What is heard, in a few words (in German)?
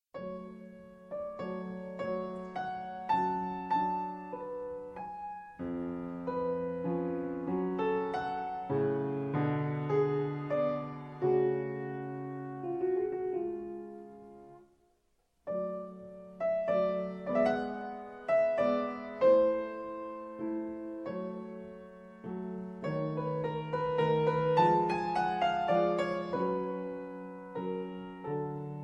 Klavierkonzert Nr 23; wolfgang amadeus mozart